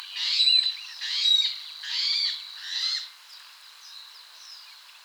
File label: Ralus aquaticus; RNN-Estuaire-Seine